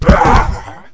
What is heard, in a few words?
death; skelebot